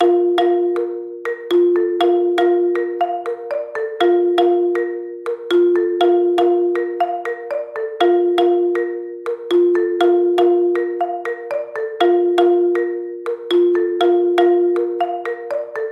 Ringtones Alarm Ring Incoming Message Mobile Phone Smartphone Music